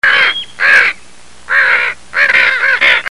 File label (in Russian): crow niam niam; data crow